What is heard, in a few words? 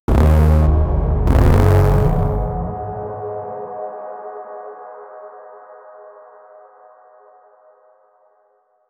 ui, extraction shooter